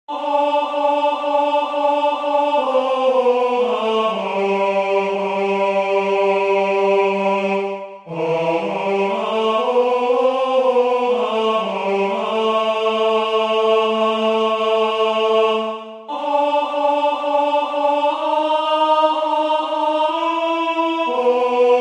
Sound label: Last Supper bass